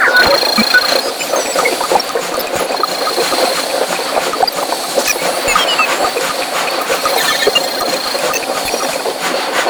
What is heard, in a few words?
Defense Station Loop; Gameplay; Warframe; Nineteeen Ninety Nine; PvPvE